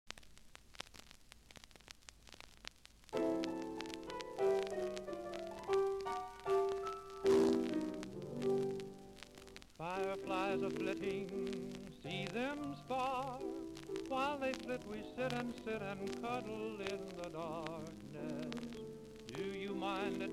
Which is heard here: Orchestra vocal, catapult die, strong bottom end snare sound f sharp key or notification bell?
Orchestra vocal